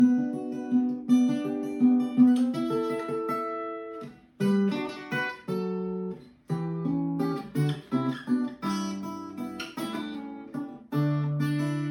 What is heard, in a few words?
Acoustic guitar